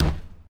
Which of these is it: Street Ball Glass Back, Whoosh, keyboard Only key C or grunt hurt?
Street Ball Glass Back